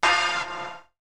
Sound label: Hit; ZERO G - Datafile DISC 1